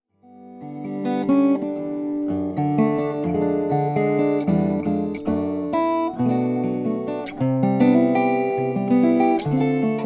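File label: lame horse instruments saddle pal bubinga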